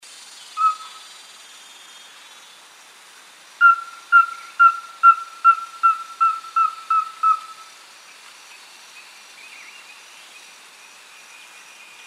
Rusty belted tapaculo

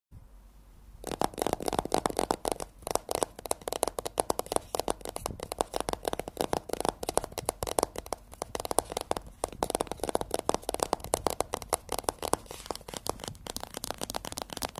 Nail on box; ناخن روی جعبه

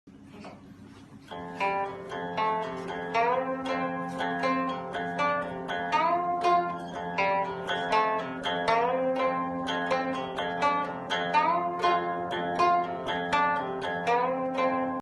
Guqin; گوچین

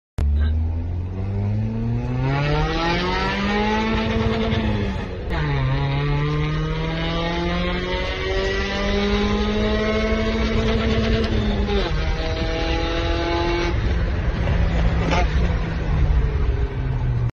Ram air; کیت مکش

